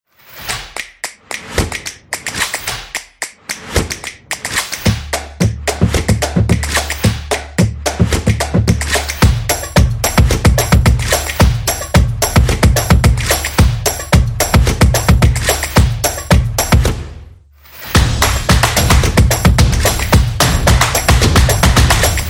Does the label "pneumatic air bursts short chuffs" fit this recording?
No